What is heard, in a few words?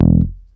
BEL; USB Soundscan vol.32; Roots Disco; AKAI